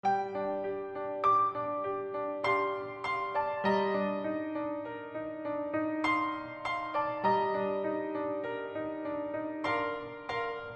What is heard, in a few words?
SINGBACK, BULGARIAN